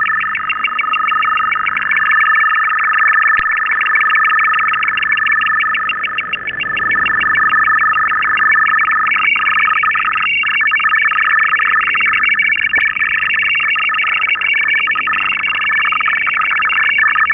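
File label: UKRAINIAN MIL. GOVT. 96 Bd DFSK-SYSTEM, idle and traffic; UKR-DFSK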